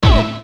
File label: Uhh + Hit, Yamaha DD-35